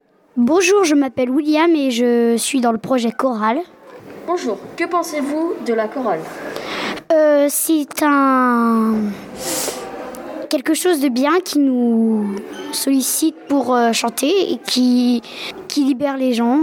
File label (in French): La chorale